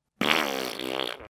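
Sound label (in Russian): звуки пук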